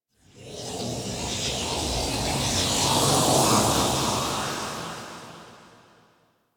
Queen Neck Grab; The War Within; Bone Pit; Golden Maw

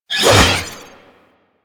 Sword Whoosh; DE; Warframe; Weapons; Sentient; Stalker Sentient Sword

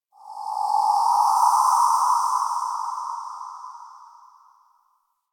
Wind Dissonnant Death Howl; Ambience; Misc Outdoor; Warframe; Infested Eris

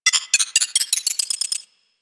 Mini Moa Detonate; Warframe; Corpus; Arisoctrats

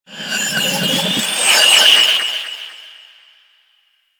Bark; Warframe; Teralyst; Vomvalyst

